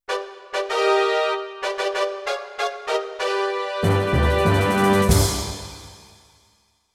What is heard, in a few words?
Entering City Jingle